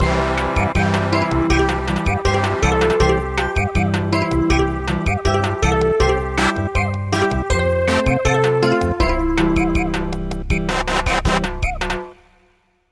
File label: Samba